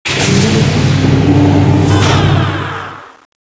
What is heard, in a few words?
bay doors